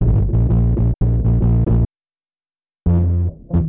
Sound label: bass reggatastic, Loops, Phonky